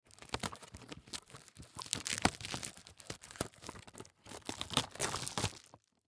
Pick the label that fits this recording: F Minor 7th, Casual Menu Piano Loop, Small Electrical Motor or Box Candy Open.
Box Candy Open